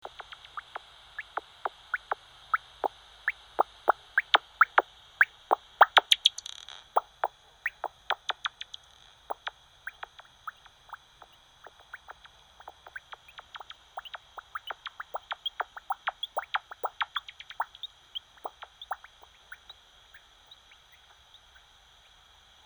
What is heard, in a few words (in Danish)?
brunflagermus med buzz